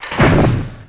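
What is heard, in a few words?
elevator; MOTS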